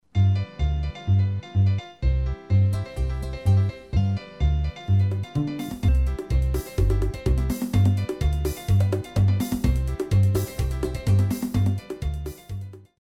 Merengue

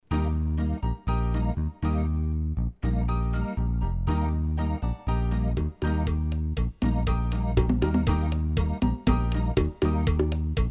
Chacha

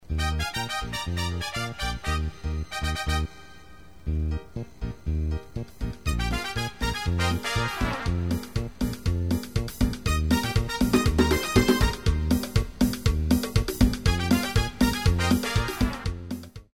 Cumbia

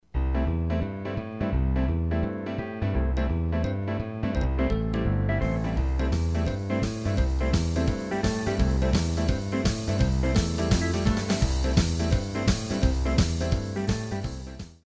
Swing Jive

